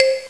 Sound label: MARIMBA; sc2k